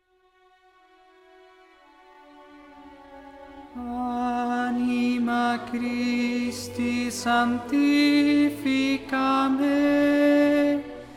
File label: Tenor; Anima Christi